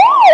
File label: norway yelp, horn; gmod; svmod